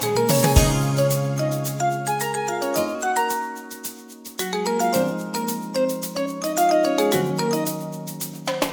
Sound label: title loop